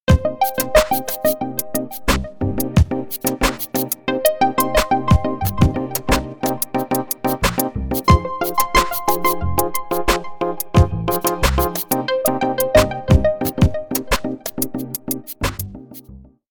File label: Boosts